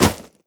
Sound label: step run, footsteps player debris